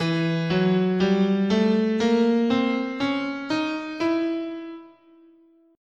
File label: E Diminished Whole Half, scale